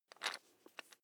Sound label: electric drill bit fasten wrench turn fast